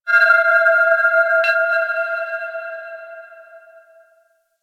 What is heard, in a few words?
Moon Chimes Medium, Ambience; Warframe; Orokin